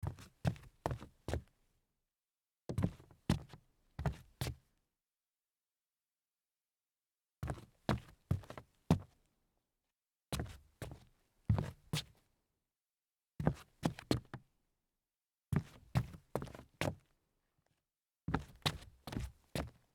sneaker sidestep hollow wood

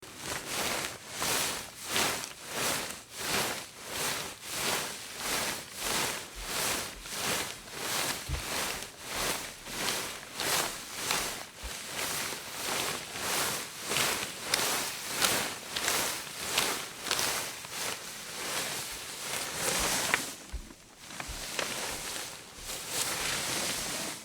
radiation suit rustle

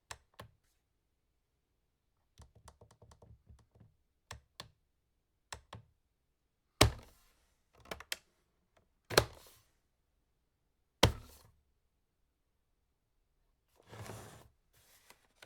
setting alarm clock

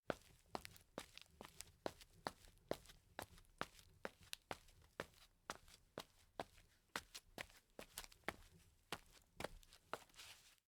walking on tile

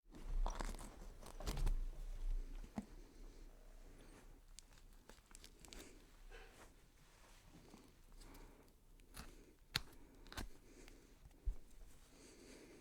cutting and chopping faint

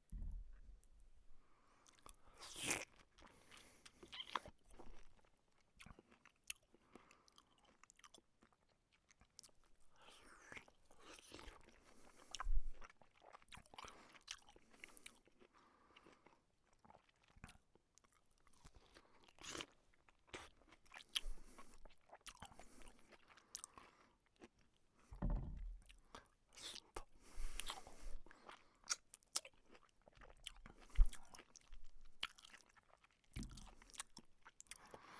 eating a juicy piece of fruit

